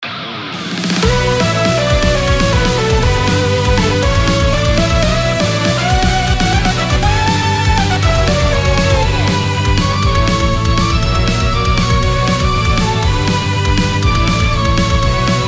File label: Battle march